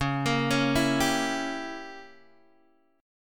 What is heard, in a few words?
C sharp Diminished 7th C sharp down Guitar Open G